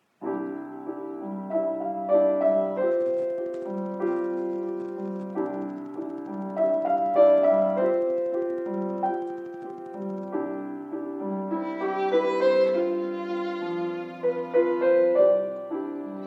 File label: piano trio; Beauty and the Beast